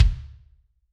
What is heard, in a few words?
Kick Hard, Drums